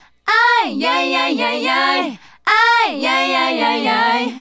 Female Group, Vocal; Sonic Foundry (Sony Creative Software); Vocal Toolbox

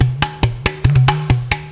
WATERCAN; Best Service; World Colours

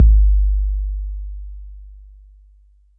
Roland 808 BD

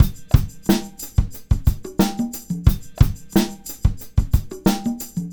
Combo, R&B Drums

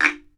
BAMBOO, World Assortment

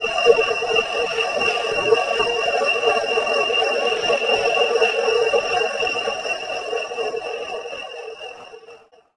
Waggon, Noisy; Zero-G Seismic Frequencies

